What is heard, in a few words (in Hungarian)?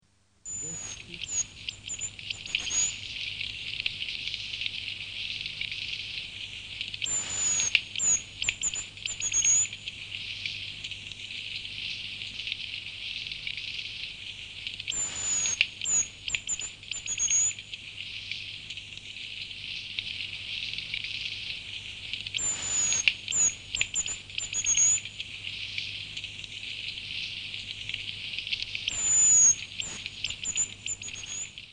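Császármadár; Madarak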